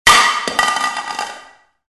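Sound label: sounds of table plates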